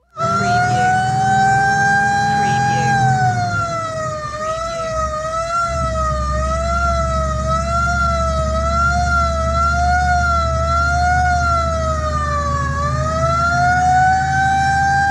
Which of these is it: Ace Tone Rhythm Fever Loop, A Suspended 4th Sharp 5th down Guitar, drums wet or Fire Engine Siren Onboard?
Fire Engine Siren Onboard